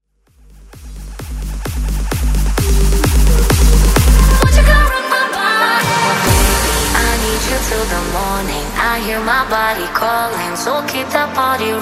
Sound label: Quick Hitter